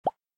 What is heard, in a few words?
drop; myxiaoxiaole; CocosCreator